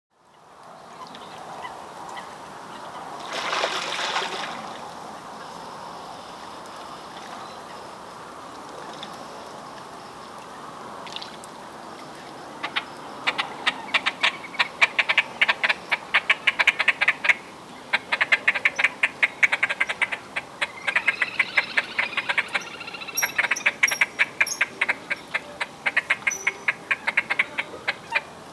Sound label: Great Crested Grebe